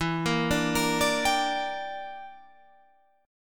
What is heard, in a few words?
E Minor 7th Flat 5th, Guitar, Half Step Down